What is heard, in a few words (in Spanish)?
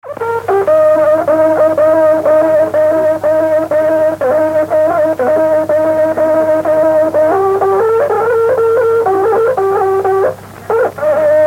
Toque de trutruka; (Puede ser en Boroa, Collico o Dollinco); Mapuche